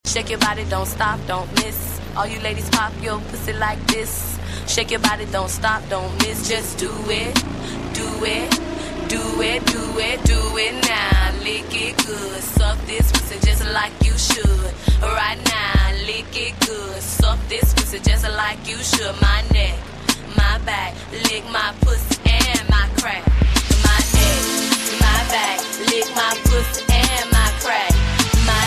English Song

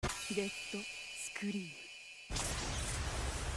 Dead Scream

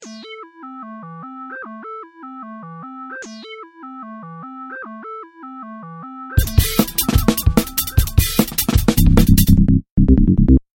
Garageband Beats

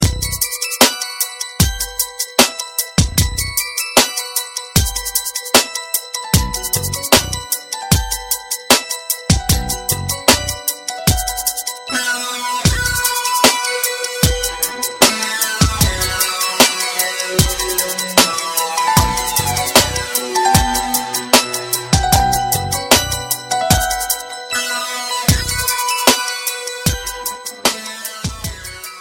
Downtown Beats

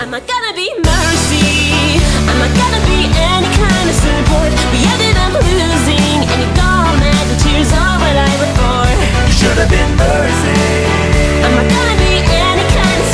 Duet

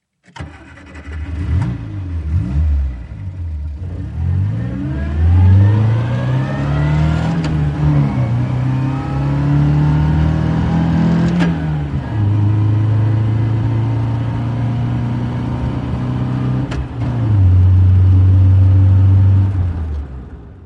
Car Driving Sound

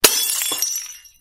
Glass Break

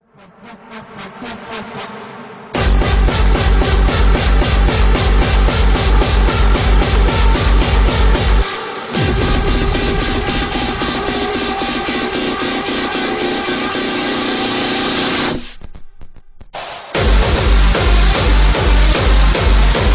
Hardbass

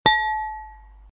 Glass Ping

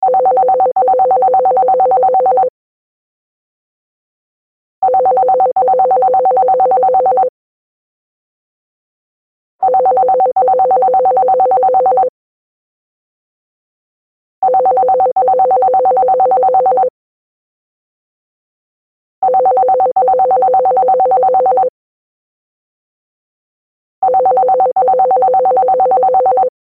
Landline Phone Ring

